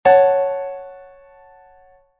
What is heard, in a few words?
F♯m chord inversion